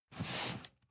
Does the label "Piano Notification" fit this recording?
No